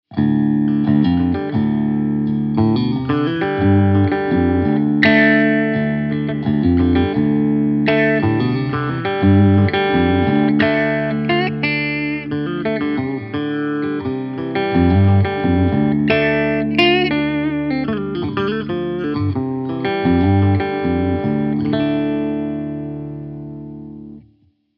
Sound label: chime